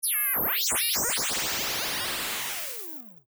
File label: Moog Modular Vibrations; syntezatory